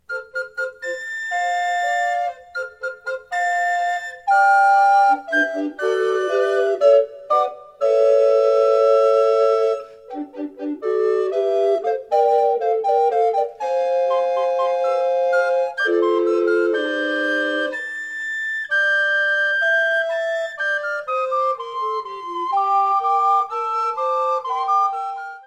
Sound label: Fanfare, recorder; In ducli